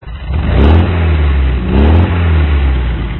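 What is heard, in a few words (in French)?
MGB vroom, moteurs de voitures MG; Mgb + Weber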